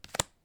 card Place, Casino; Kenney's Sound Pack

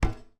FOOTSTEP Metal Plate Run Barefoot Male; Timothy McHugh; Barefoot on Metal

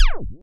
laser Small; Kenney's Sound Pack; Sci-Fi Sounds

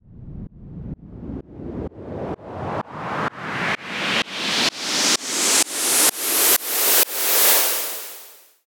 Short Sweep, White Noise

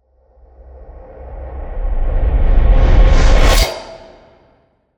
Whoosh; SoundBits; Whooshes & Impacts

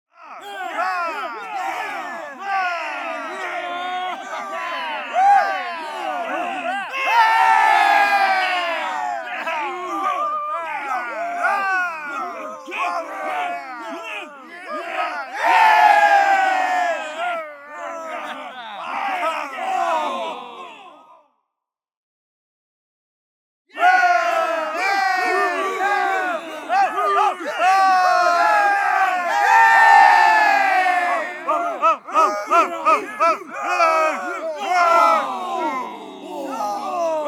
CROWD Fight; Rock The Speakerbox; Hero

